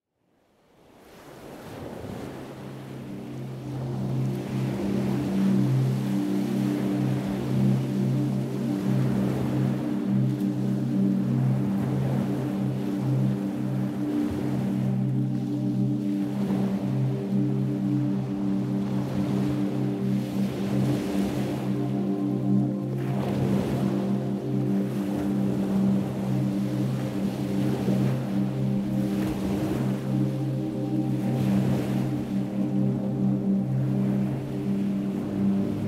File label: spa music meditation music relaxing music for stress relief